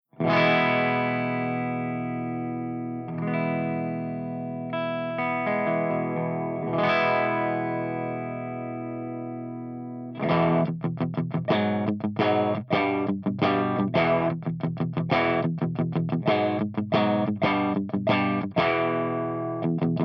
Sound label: BLACKSTAR HT CH1 CLEAN V30 P90